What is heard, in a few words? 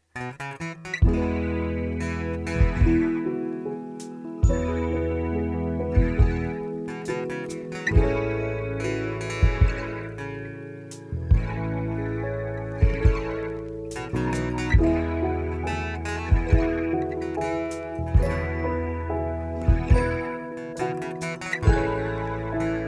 Soulful soothing; Jamie Coleman, Film Composer